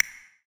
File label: Whistler voice sound; heartbound